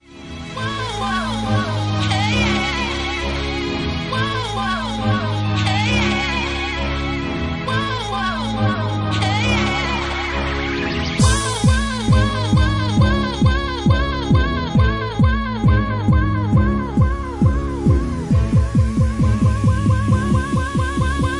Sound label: Organ Mix